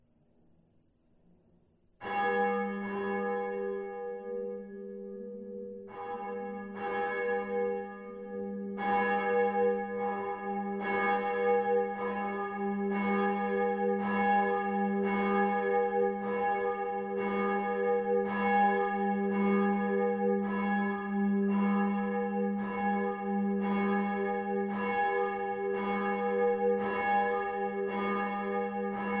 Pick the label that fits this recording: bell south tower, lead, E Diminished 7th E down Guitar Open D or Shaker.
bell south tower